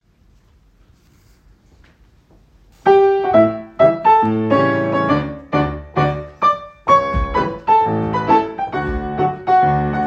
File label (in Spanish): Musica Varones Grupo